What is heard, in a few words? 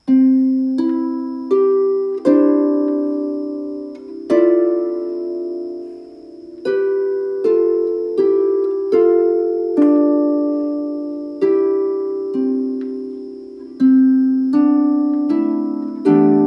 harp